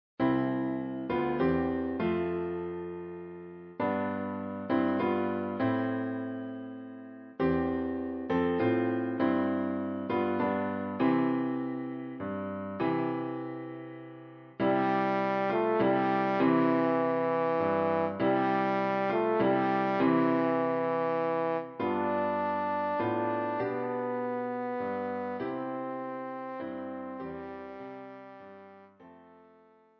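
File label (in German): Stille Nacht heilige Nacht Horn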